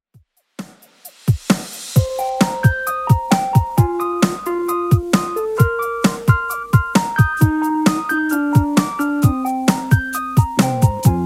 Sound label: 70s Redrum 132bpm